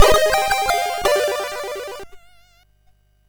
Jingles, sid MOS6581R2

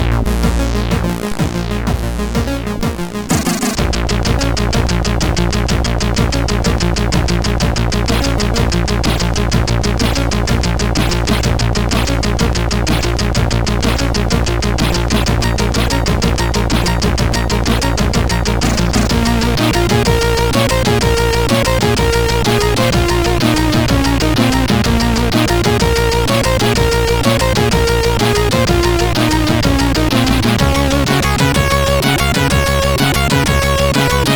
Title, CSG8580R5 sid

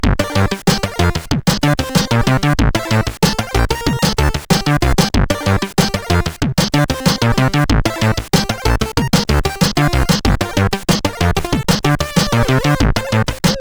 Su-Sweet, CSG8580R5; sid

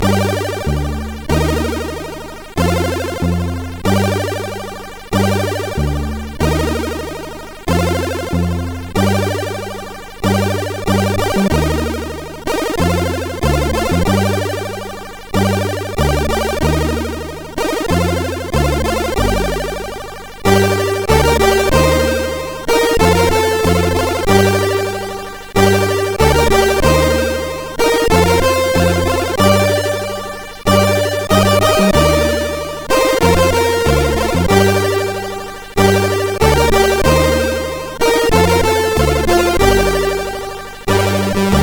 Mix, MOS6581R4; sid; Fairlight

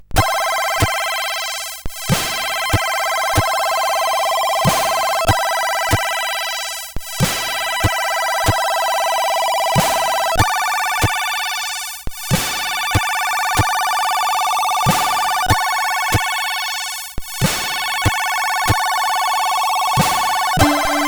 Oxygone Symphony, CSG8580; CSG8580R5; sid

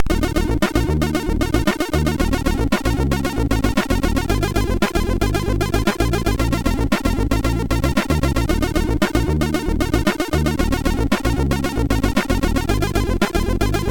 Quick Intro, MOS6581R4

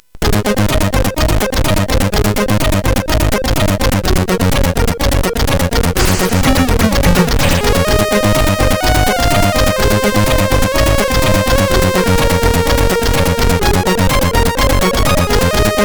Co-Axis Remix, sid CSG8580R5

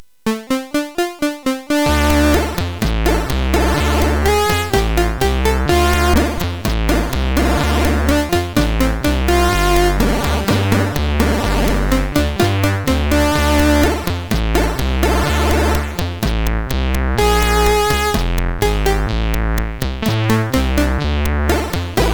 Funk, CSG8580R5

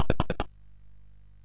MOS6581R2, GAMES; Sammy Lightfoot

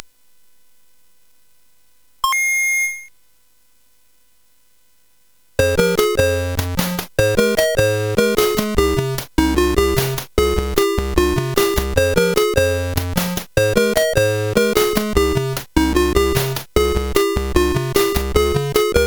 CSG 8580R5; HVSC; Gameboy Tune

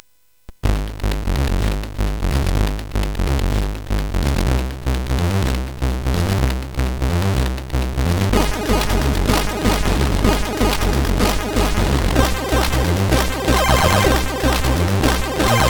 Kerhioewhihmusic, CSG8580R5; hvsc

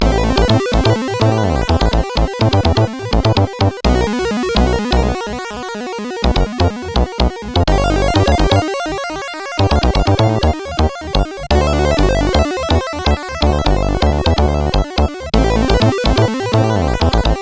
Blues, CSG8580R5; Spike Super Blues Experience; hvsc

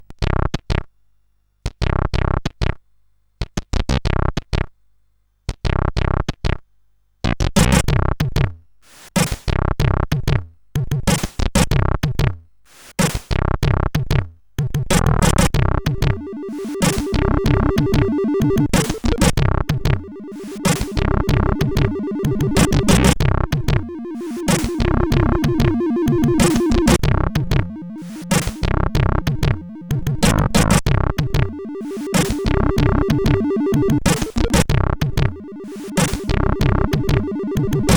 Bass Loop, CSG8580R5; sid

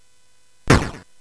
Dark Castle, CSG8580R5

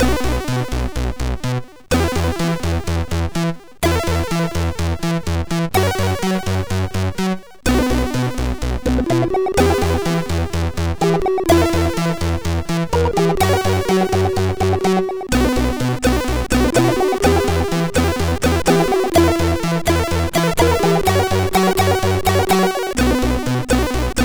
Blue Ocean sid MOS6581R2

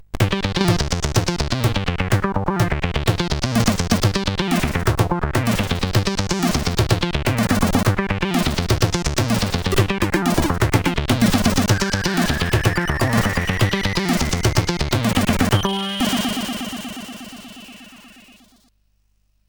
Heavy Acid, CSG8580

